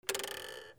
GARAGE

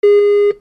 ALARMS

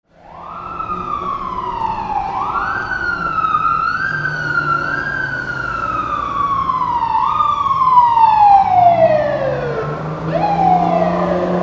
VEHICLES

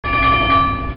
INDUSTRY

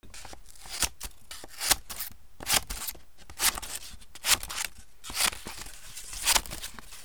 PAPER

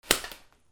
CARTOON

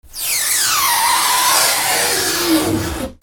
FRICTION

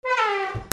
HOUSE

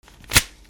RIPPING

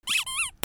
DOGS

